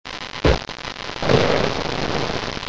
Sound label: glitch